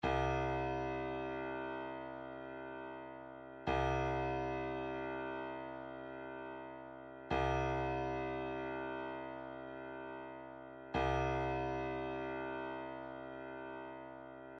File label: 132 vibra slap, midi; Udology